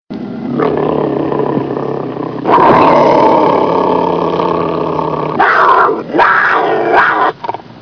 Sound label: angry tiger